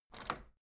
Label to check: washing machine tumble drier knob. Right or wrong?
Right